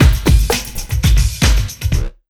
ROCK 132, Big Beat Drumloops; AKAI

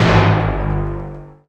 Fat Drum; Zero-G Jungle Warfare 1, 2 & 3; 90 s Sample CDs

